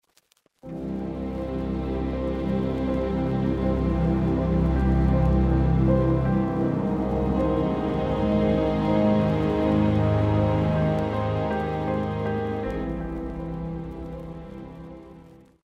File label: declick, noise